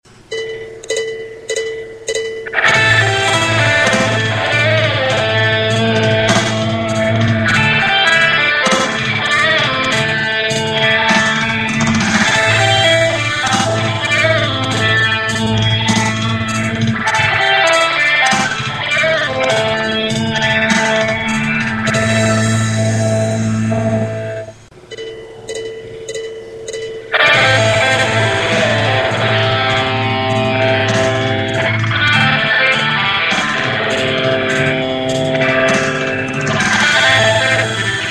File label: licks for rock soloing, guitar